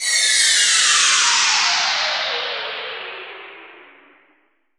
Downsweeps

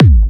Bassdrums UK Trance Kicks; Vengeance Samples

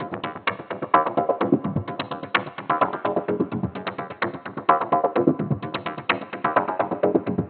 VFX2 Loop 128 BPM

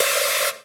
Synths C; Vengeance Samples

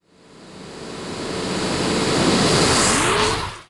Uplifter

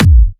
Kick; Take Me Away

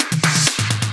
Fill Ins 128BPM; Vengeance Samples

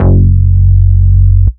Root F#, Bass; Vengeance Samples

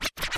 Cuts, Vinyl Record Samples

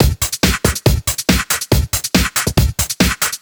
Breakbeat 140 BPM

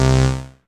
Synth Sound; Vengeance Samples; VEE Synth Shots

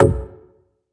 Synth Cuts; Vengeance Samples; VES1 Instruments

